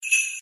Sleigh Bells

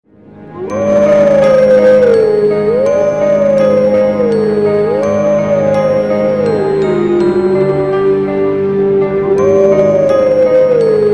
Wolf Healing

